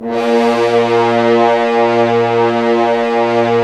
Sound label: Horns; Roland - LA Composer vol. 4